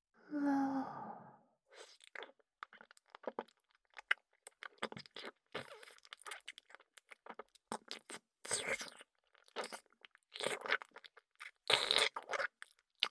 ディープキス; ムチムチでダウナーなニート嫁の奉仕; 眠気を誘うディープキス

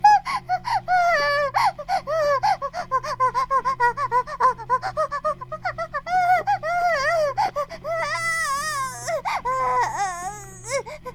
バイブ放置, ASMR

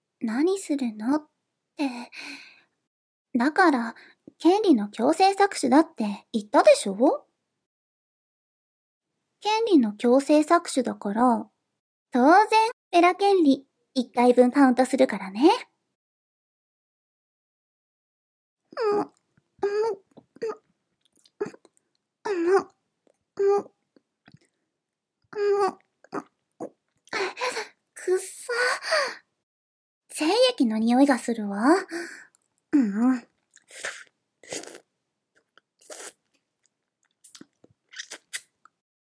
フェラ

